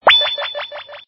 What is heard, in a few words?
Sms Drop